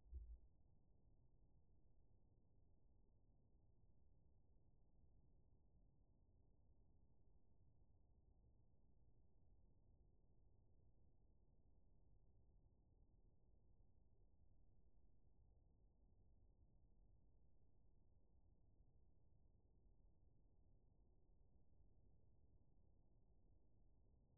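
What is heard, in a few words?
hydrophone; ICLISTEN HF6092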